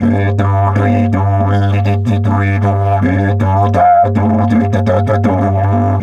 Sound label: G 80 bpm, didgeridoo; Big Fish Audio - Didgeridoo and Other Primitive Instruments